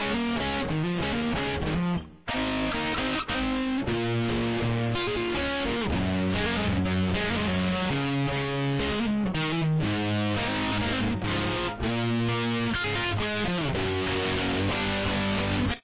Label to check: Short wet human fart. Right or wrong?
Wrong